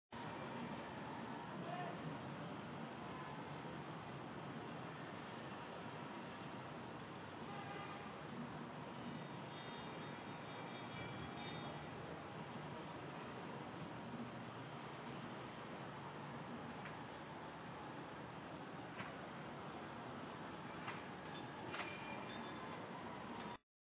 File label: ambience location